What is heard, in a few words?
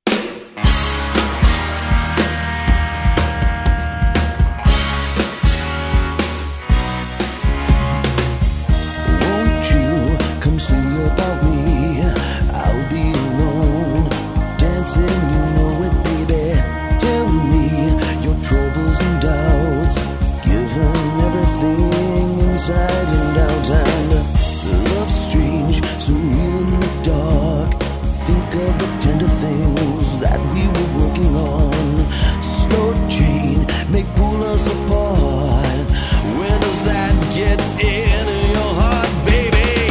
Cover Band